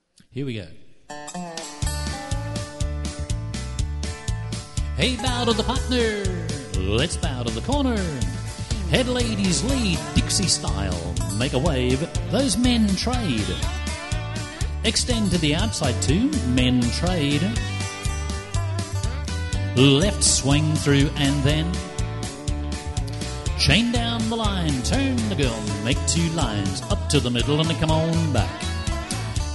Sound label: hoedown vocal